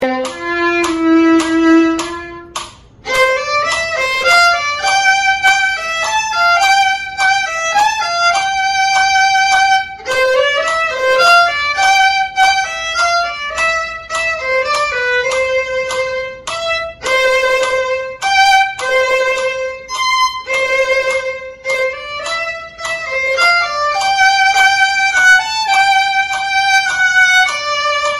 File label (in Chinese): 小提琴; 玩具交響曲; 篠崎小提琴教本 新版; 示範演奏